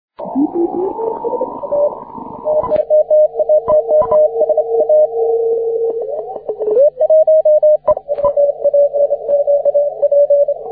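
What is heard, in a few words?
40m continuous wave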